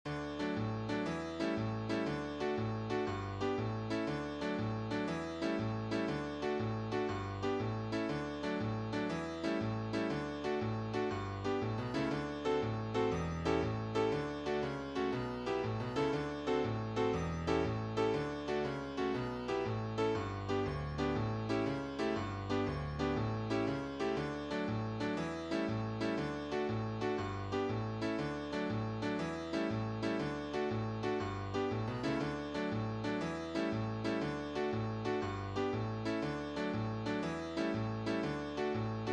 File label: Elven Jig (Just Piano)